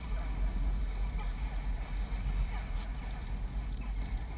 Ambience Loop, outdoor

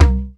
HI-TECH KITS; 90 sSampleCDs; Crypton - DrumBase5000; INDIA

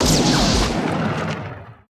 GSHOT, LA GUNSHO; Total Control - Action Cutz

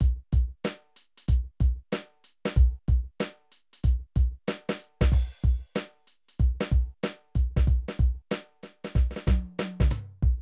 Drum loops, Streetsoul

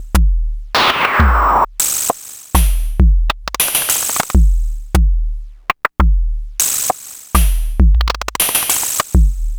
Money Groove, Beats; Sonic Foundry (Sony Creative Software); Ma Ja Le Ethereal Textures

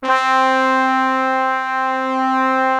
BRASS C3, ORCH BRASS; Greytsounds VOL-1

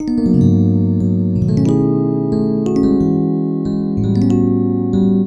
Keyboard

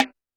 Snare, Roland TD-20

